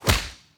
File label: Mage Attack hit